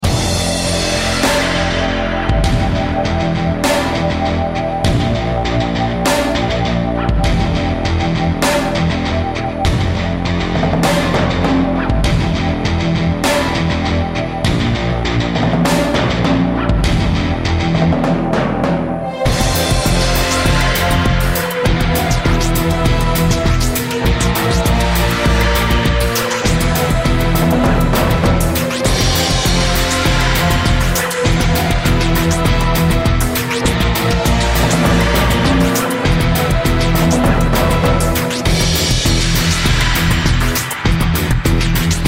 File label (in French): Ambiance action; william